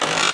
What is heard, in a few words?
switch; Odyssey Demo